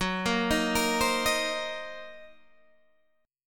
Gb 6th Flat 5th Gb down Guitar DADGAD

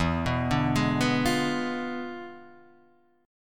E 7th Flat 9th E down Guitar Drop D

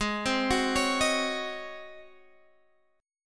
Ab 11th Ab Guitar Open F; arpeggiated; JGuitar

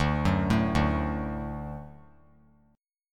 D Major D down, D Chord; D arpeggiated; JGuitar

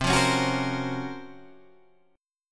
Db Major 13th C

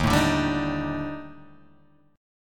D sharp Major 7th Flat 5th, down, Guitar, Open G

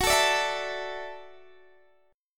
Gb Minor 7th Sharp 5th, Guitar Open D

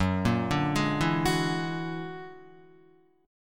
Gb Augmented 9th Gb down Guitar 4F,4A,5C,5G,5C,6E; Gb+9 arpeggiated

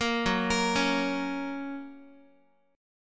Gb Major, Guitar; Listen to Gb arpeggiated

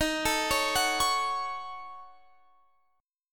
Eb Minor 7th Flat 5th, down, Guitar, Open F; arpeggiated

